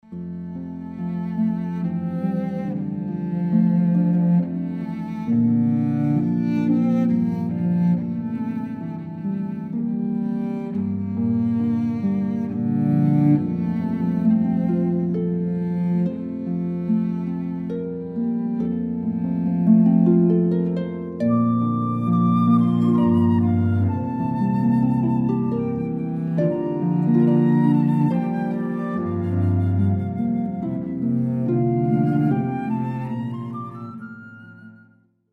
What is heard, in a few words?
Intermezzo, Cavaleri, harp flute cello